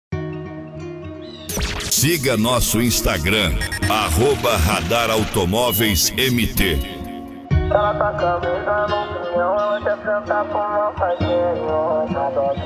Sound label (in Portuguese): pagode e funk